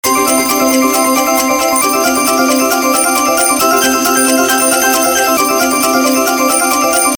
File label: countup; WolfMoon